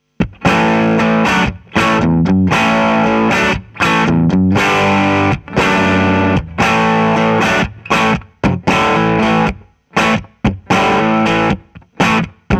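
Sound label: clean MID boost ES335 Mic; Bluetone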